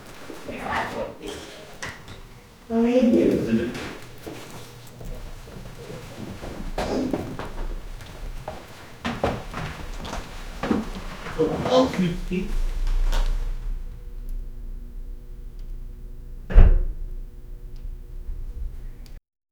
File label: the man stood up from, room